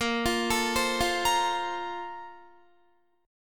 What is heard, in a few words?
Bb Major 7th Suspended 2nd, Guitar; BbM7sus2 arpeggiated; JGuitar